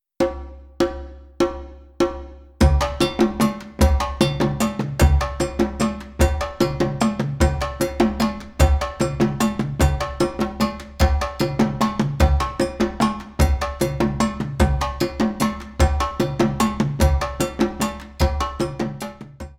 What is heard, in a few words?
Ensemble